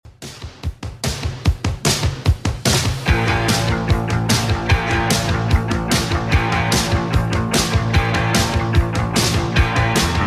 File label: 80s Redrum, 148bpm